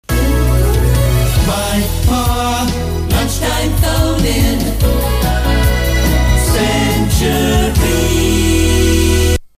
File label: jingle; Mike Parr, Lunchtime Phone-in; Another top-up jingle